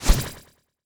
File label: Tech Whoosh